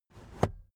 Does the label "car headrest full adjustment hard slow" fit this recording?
Yes